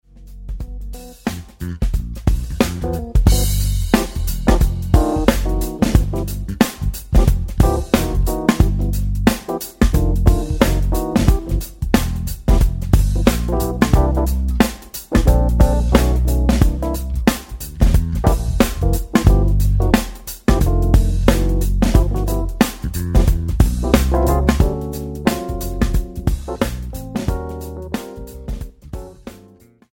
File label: Funk, backing tracks